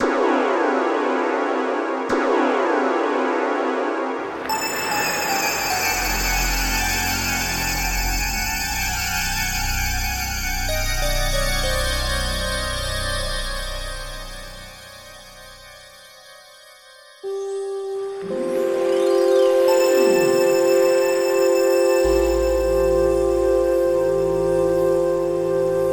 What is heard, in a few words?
damage; EverQuest